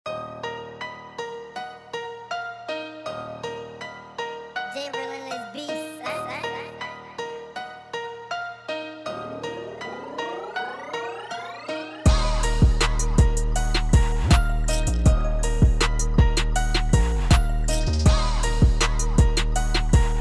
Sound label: Instrumental